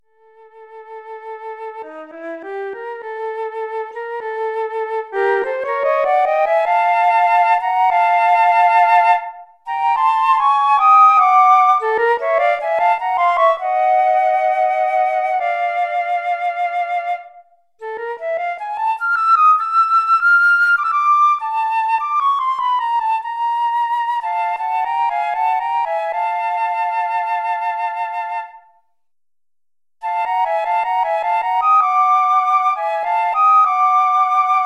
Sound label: unaccompanied flute duet; This flute duet was composed shortly after the 9/11 Twin Towers tragedy in the USA, when the whole world seemed to be in a state of shock and grief